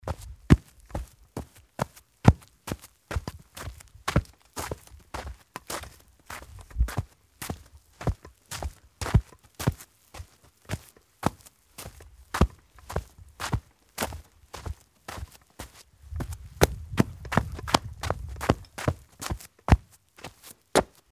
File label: footstep shoes